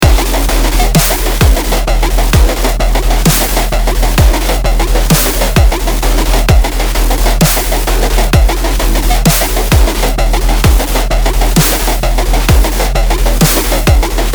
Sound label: dubstep